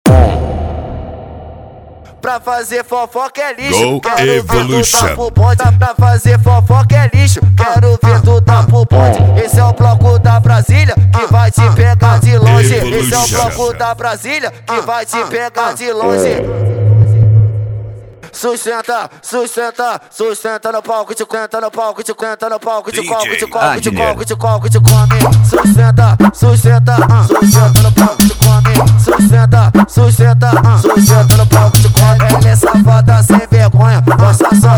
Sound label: funk tumdumdum funk bass